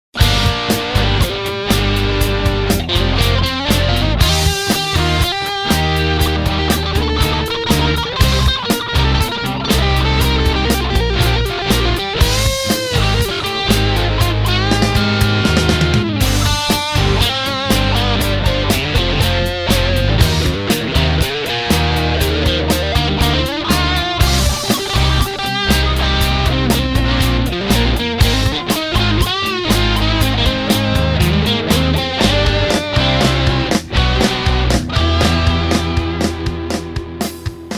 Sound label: guitar solo